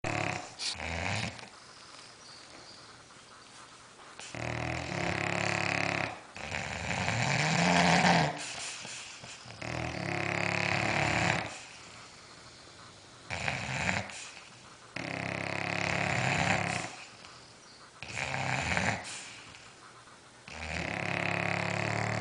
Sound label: Two snoring dogs; meloboom